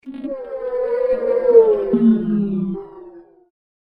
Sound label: Communicate Strange Alien